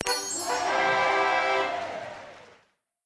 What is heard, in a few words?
bonus achievement